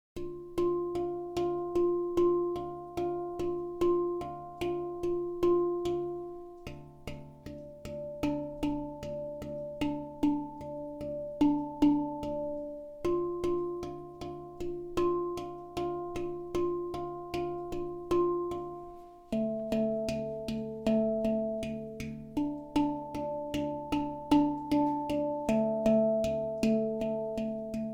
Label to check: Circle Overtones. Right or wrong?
Right